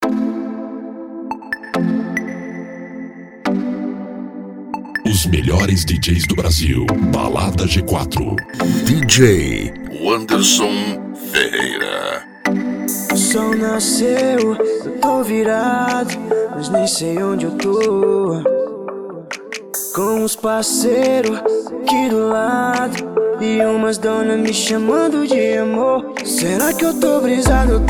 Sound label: rap house